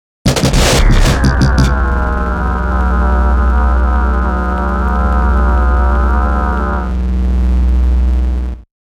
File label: Mysterious Warp Glitch; 5.1 STEREO MIX; Warped; Blastwave